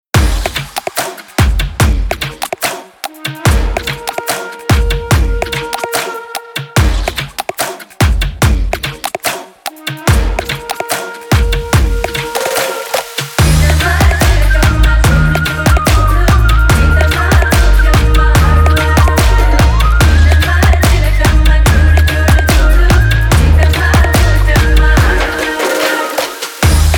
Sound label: Remix